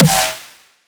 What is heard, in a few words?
snare; osu